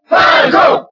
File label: Cheer French; SSBB